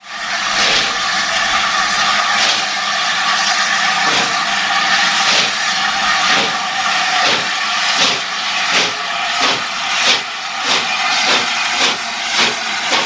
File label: lner a4; simutrans; Pak128.Britain-Ex